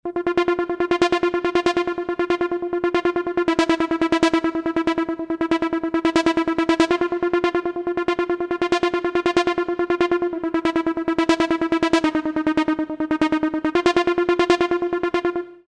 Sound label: Synth 16th Pulse